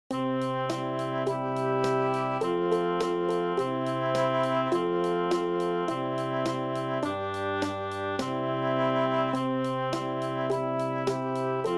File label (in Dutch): Boomwhackerfeest met Sinterklaas; Sinterklaas en Kerstmuziek